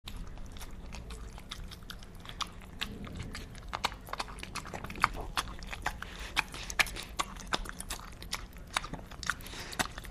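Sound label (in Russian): zvuk chavkaniya, sound of chomping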